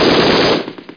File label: M GUN